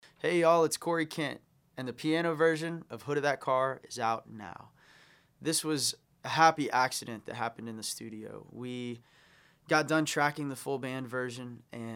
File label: Piano